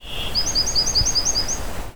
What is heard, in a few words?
Bachmans Sparrow